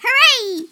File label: hooray